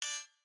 Turret Button

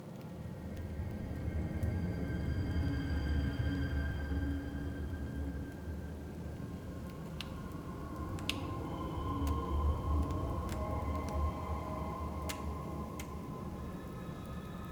amb interior; thresh; spirit blossom

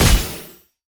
minigame SFX assist; Kayle; bloom minigame

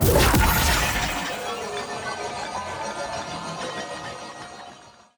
Attack Heavy; bloom minigame

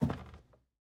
Footstep Lab

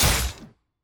Enemy Attack Basic Hit; bloom minigame

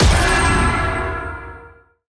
dark binding hit; bloom minigame

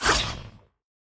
Enemy Attack Basic Cast; bloom minigame

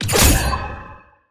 minigame SFX assist; Irelia; bloom minigame

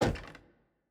Footstep Basement Wood Stairs

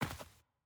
Footstep Grass

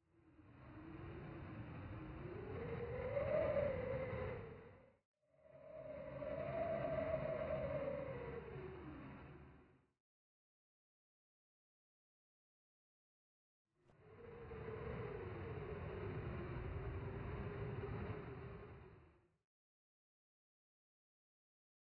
Howling Wind

